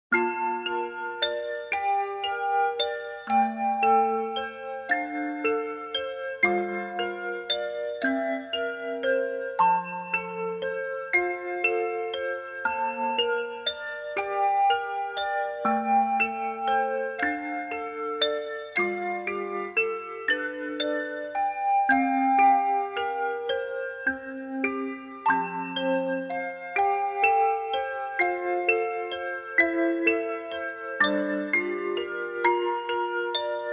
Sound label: babys lullaby